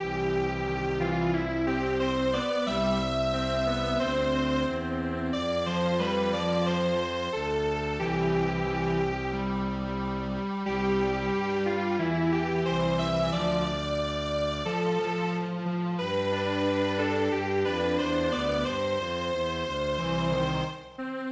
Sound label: Strings Quartet